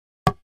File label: 2x4 Impact, hits